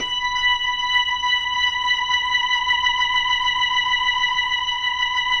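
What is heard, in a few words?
4B L, Slow Fast Hammond, Organs; E-MU Producer Series Vol. 5 – 3-D Audio Collection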